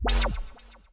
Magic

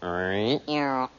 Rrree Neurgh; TheChaseBuzzer

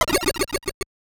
Teleport; Mega Man 4

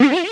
JUMP; Plok

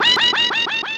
DIES; Plok

